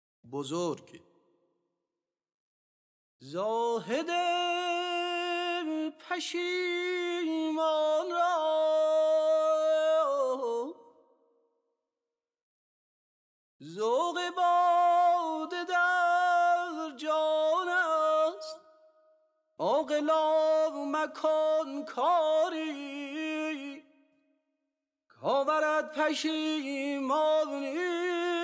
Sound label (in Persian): Bozorg Shur Radif-e Karimi; Dastgâh Shur